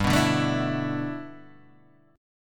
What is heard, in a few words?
E 7th Suspended 4th G, guitar; E7sus4/G strummed; JGuitar